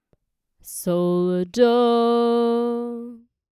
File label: the isolated upbeat